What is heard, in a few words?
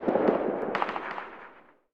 echo town